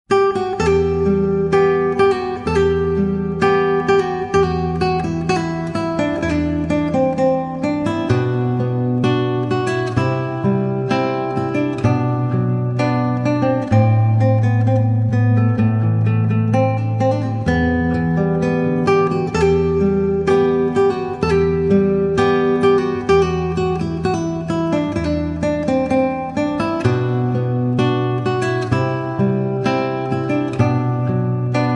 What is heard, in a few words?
Guitar; بی کلام با گیتار; نسخه دوم با گیتار